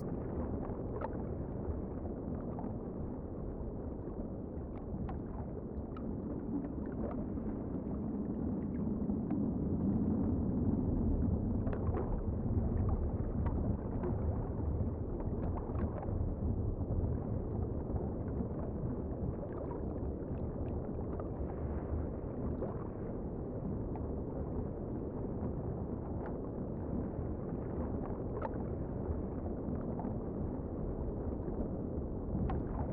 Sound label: soundscape